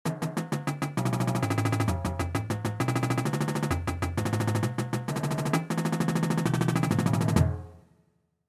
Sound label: Triplet Rolls, warm ups